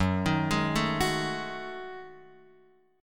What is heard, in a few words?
Gb Minor 6th Add 9th Gb Guitar Open F; arpeggiated; JGuitar